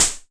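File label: slap, percussion; Masterbits - Total Zone; ADD.PERC.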